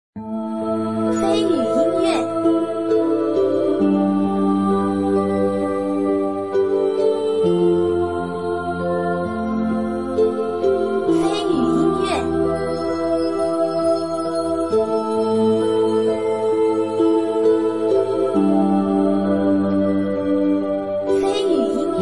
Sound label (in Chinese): 西湖堤-抒情-宁静